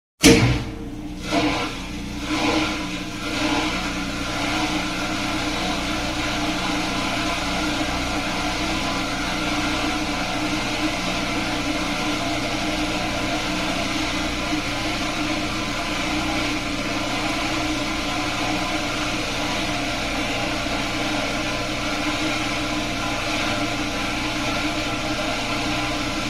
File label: Dish washer; SOUND EFFECTS1